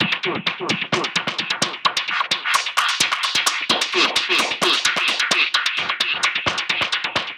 Perc Loop 130, techno; Techno Sounds 2024; Percussion

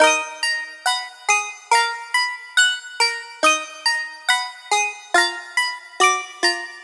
Riddim Melodic Loop D# 140 Chimes; Ghosthack; Riddim Essentials Volume 2

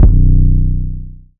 808 - B; 808s; Cymatics; Cobra Hip Hop Sample Pack

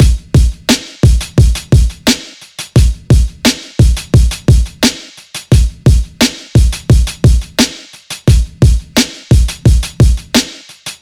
Drum Loop - 87 BPM, Boom Bap; Earth; Cymatics - ANALOG EVOLUTION Beta

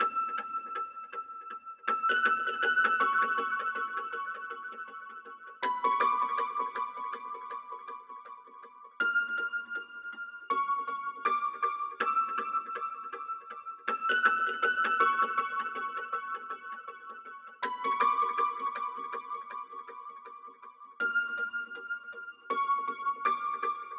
Lofi Melody Loop - 80 BPM F# Min Bells; Melodics; Loop Stems; Cymatics; Eternity

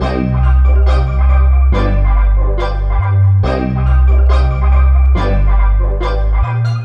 Melody Loop 140 BPM C Min, Hip Hop; Melodics; Melody Loops; Cymatics; Cobra Hip Hop Sample Pack

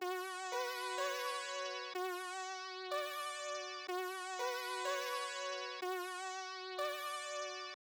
124 BPM F# Min Synth, loop stems; Melody; Cymatics; 2022 Melody Collection Platinum Expansion; Skeletons

